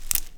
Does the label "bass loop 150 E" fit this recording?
No